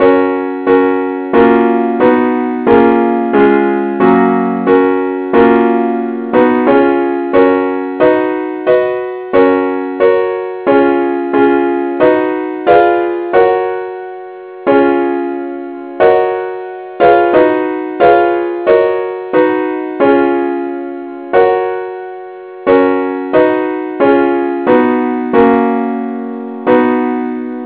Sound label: christe Sanctorum advnce acc key ges